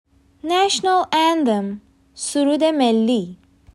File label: National anthem